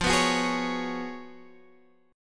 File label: F sharp Major 7th Suspended 2nd Suspended 4th, F